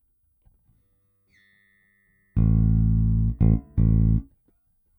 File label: E Bass